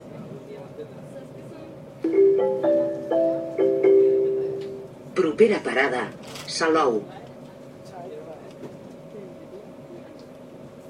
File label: train announcement jingle